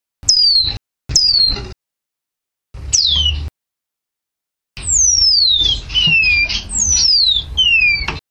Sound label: early variations then full voice, lavender waxbill